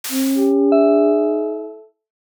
logo audiobook page resolve

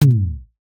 bold text stamp

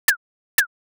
horror clock tick

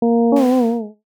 confident wrong brass

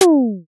comedy oops drop

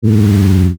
frog croak soft

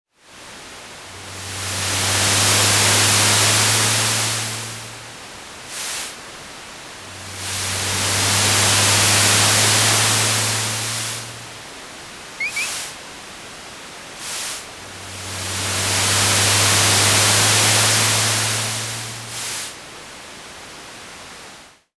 wind open field

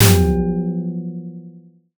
low boom

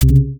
ui error low; buttons ui

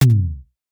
important red stamp; daily life

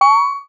water drop single

